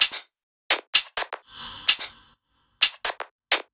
tops 128; Anthemic.Electro; tops loops

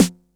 909 MD-SNR, TR-909 DRUMS

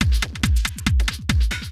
TECHNO BEATS 140

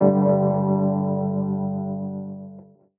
G Keys, One Shots; Keys & Synths; Touch Loops; BlissedOutBeats

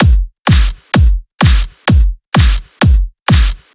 drum loop 128 Strp; Anthemic

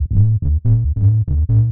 TECHNO BASS 140 A

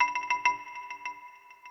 FX Industrial 140

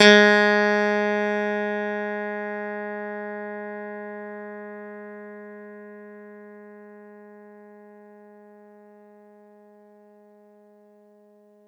Clavi, Digital Keys

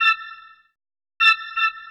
house synth 125 A, keys

